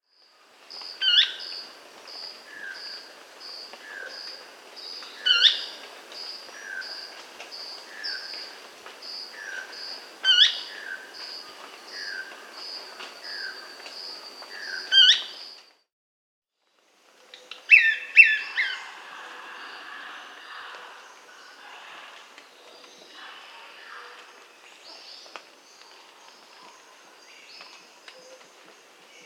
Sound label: Brownish Flycatcher (Cnipodectes subbrunneus), Bolivia; S. America